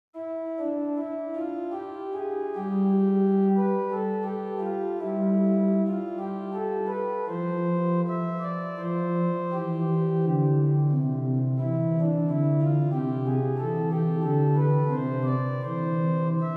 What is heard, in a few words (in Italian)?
danze